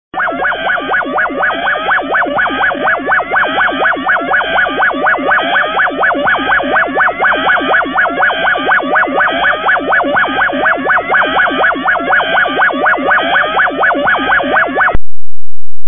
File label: sting